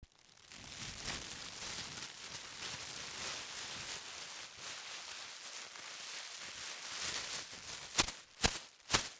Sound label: Plastic